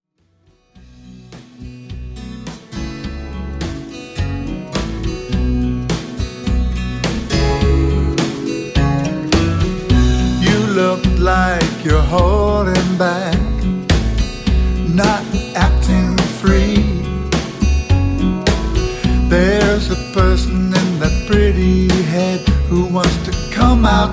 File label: voices